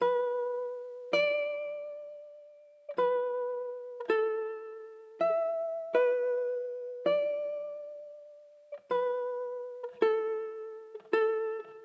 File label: G 162bpm pluck; Bleu Moon